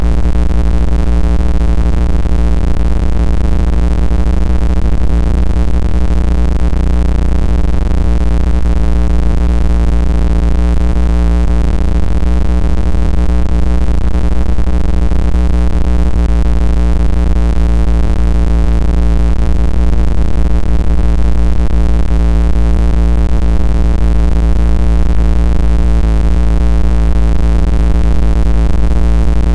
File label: generate a 55 Hz rumble; experimental